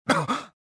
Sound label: choke; jedi